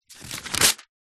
household book telephone directory page thin rip out